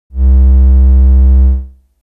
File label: Tristar, synth lead